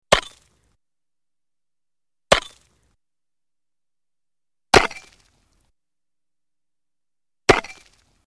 Chop Ice On Lake Shore, Axe Or Pick Into Solid Material, Some Ceramic Tone 4x